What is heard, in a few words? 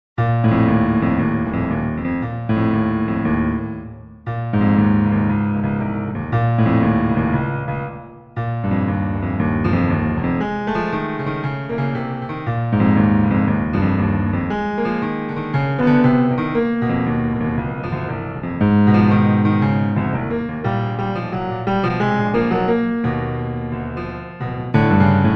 Piano Prelude